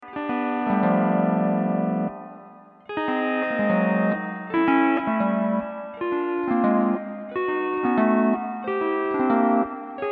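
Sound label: backrake exercise E9th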